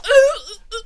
Harvester Death Vocals

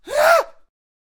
Poacher Death Vocals

